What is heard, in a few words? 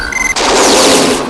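PENETRATE, laser handgun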